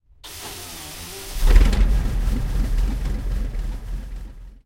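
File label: Cromwell engine interior start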